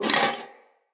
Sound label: Foley Armour Fill H; Enemy; Mockeries